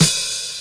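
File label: crash, drums; mercure drums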